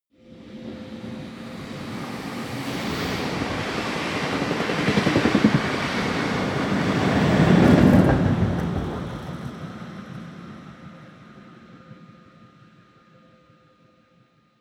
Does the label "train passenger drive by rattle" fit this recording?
Yes